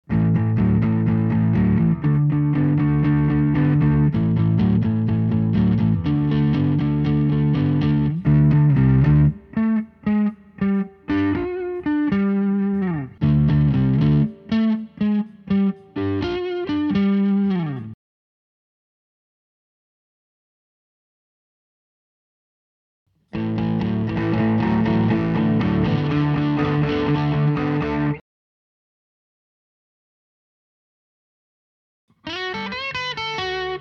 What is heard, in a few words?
sm57 e609 compare jensen